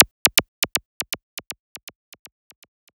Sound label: Zapps, sci-fi